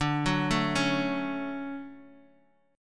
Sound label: D Major 7th, Guitar, DADGAD